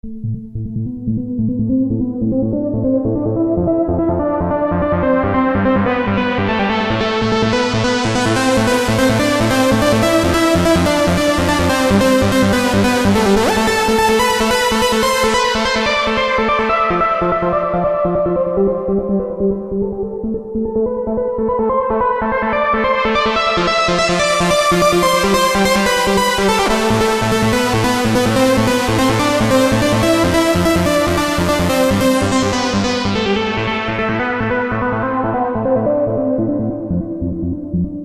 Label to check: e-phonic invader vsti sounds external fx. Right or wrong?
Right